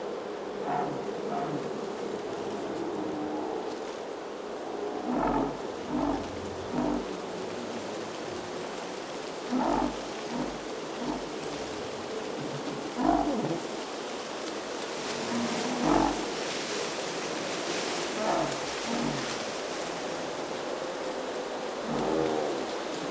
UNDISCOVERED Designed wild storm in escaped lands whistling wind in shrubs; ominous